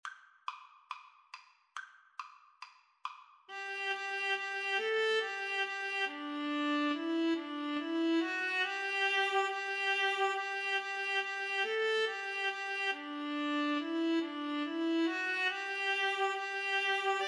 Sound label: vla duet, Viola Cello Duet